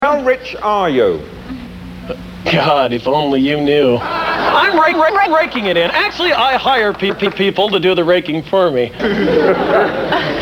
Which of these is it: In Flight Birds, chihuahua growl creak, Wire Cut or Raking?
Raking